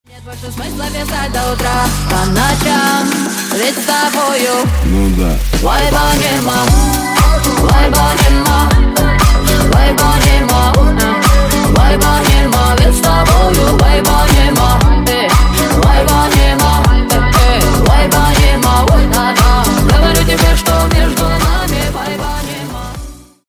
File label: remix